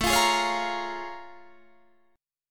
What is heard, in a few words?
Bb Minor 7th A; Bbm7/A strummed